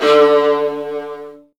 Virtuoso Strings; ILIO; Kirk Hunter Virtuoso Strings VOL-2

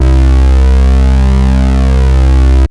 ANALOG, BASS; Best Service; Hyper Dance

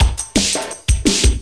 loop, 170 BPM, drum & bass; Ueberschall; Drum & Bass Flash Trackz

